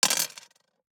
DICE on Glass, Throw and Roll, Standard, 3 Three Dice; Samples; ArticulatedSounds; DICE Sfx Party (Extended)